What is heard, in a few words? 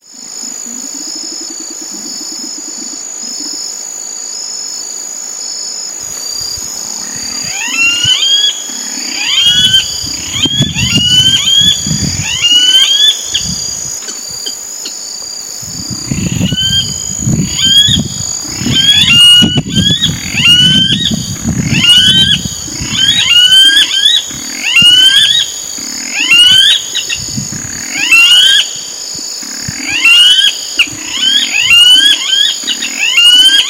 Pardirallus nigricans; N Cunha PESM; Silveira